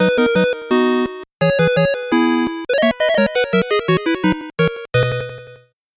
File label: 8bit jingle slow